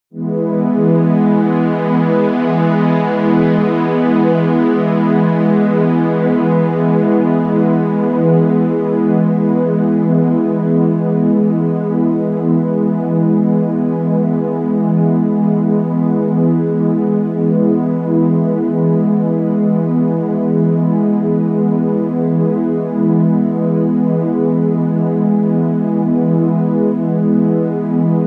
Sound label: sweep air, music elements